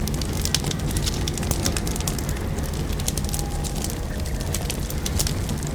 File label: fire; LoL; blue purple fire